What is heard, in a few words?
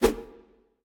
Whoosh; smite; izanami